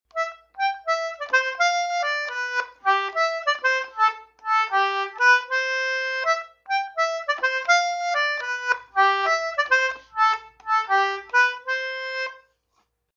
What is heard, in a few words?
concertina; Chinese